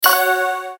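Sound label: success; MazeAndTourist; Kids Games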